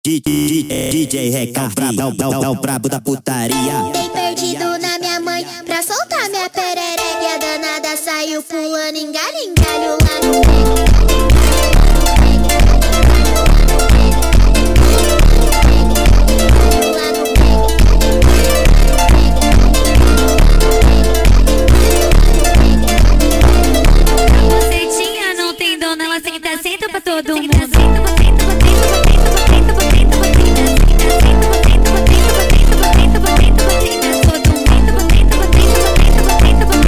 sped Up, funk

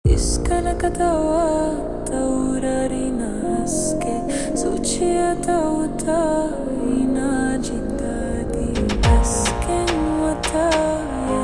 Funk super Slowed, funk

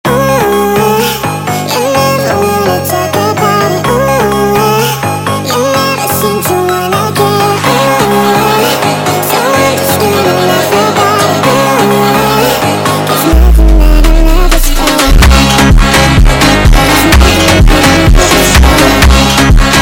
Funk sped Up, funk

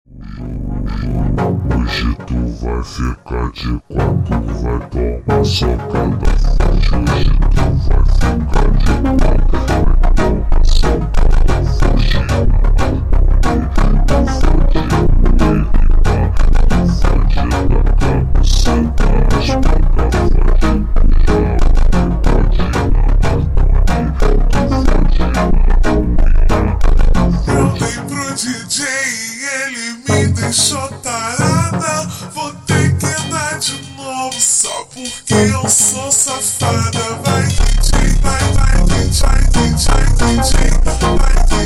ultra Slowed, funk

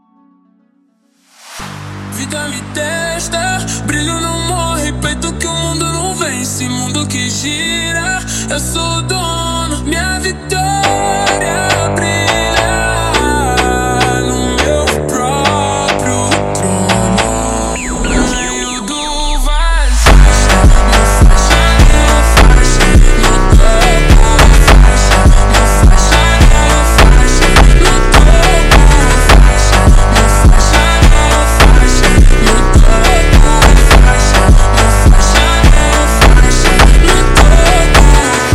slowed Reverb, funk

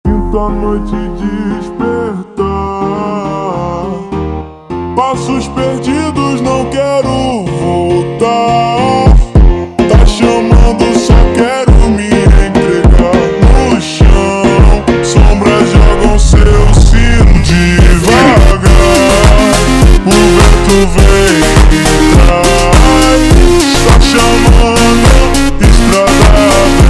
super Slowed, فانک

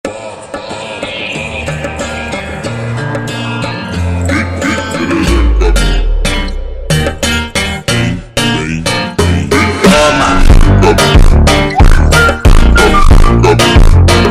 Whistle Funk Ultra Slowed

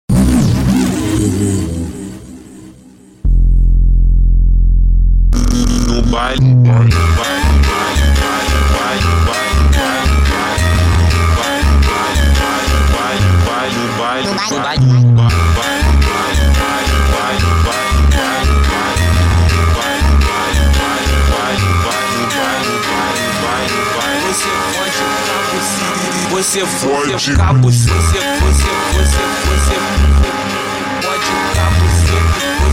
Vocal Ritmada slowed, funk

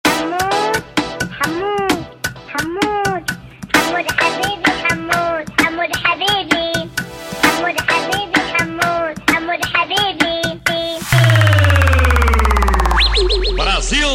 Funk

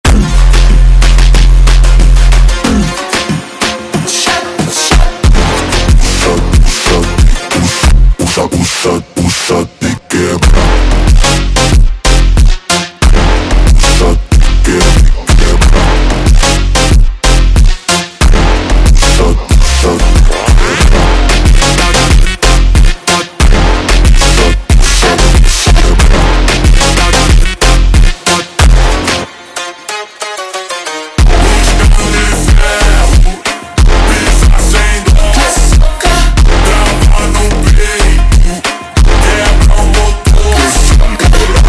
super Slowed, funk

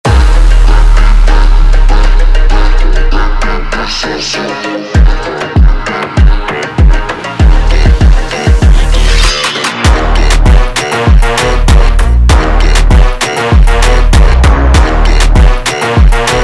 slowed, funk

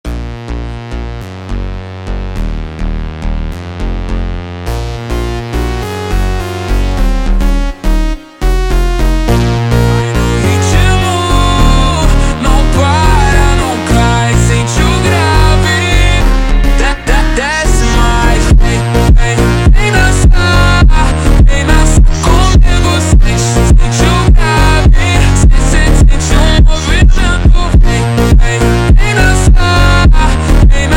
super Slowed, funk

